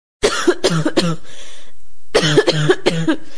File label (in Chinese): 妈妈咳嗽